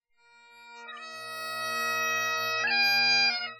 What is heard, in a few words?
bagp